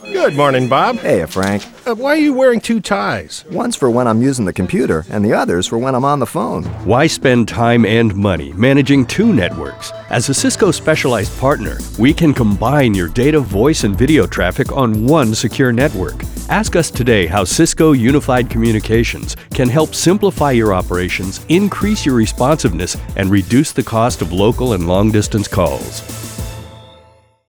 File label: on hold